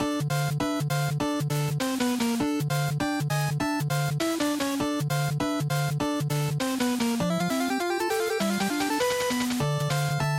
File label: Action Stage Loop, bgm